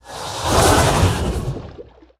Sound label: Coilfang Constrictor Attack